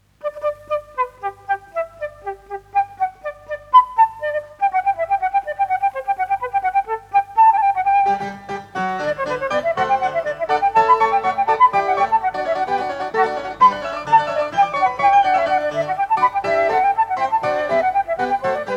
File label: Creamsonata G major - III. Adagio; Op.1,5